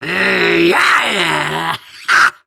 Laugh; Warframe; Grineer Catbrow Handler; Battle Barks

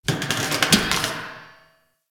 Derelict Metal Creak Small, Interior; Ambience; Warframe; Orokin Derelict

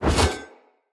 Split Sword Whoosh Heavy; Weapons; Swords; Starter Split Sword

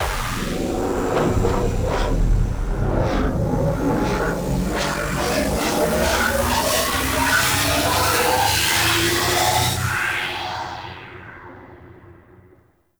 Resurrection Cast; Trinity Prime Boss; Warframes

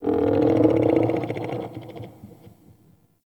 Creature Growl; Warframe; Tau

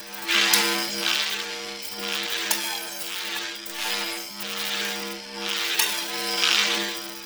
Glowing Egg Tiny Loop; Ambience; Props; Warframe; Entrati

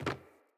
Sandals Land Wood, footsteps; Player

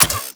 Railgun Fully Charged

